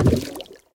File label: Monkey Footsteps Water; BTD6; Legends